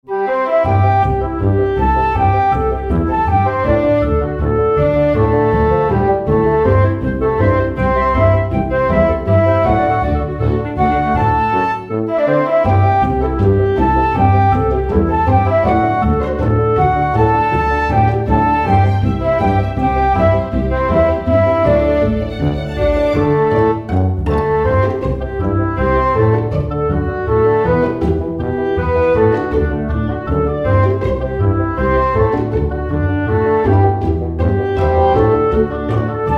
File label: ringtone